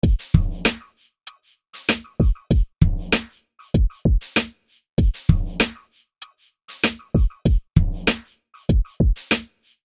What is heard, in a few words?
Beat